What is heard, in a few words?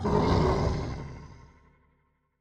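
Damage; Ouroborous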